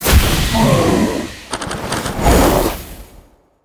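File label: Boss temple guardian spawn, monster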